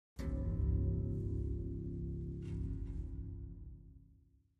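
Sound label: Harp Deep Single Tone; strings harp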